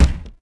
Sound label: hit, Impact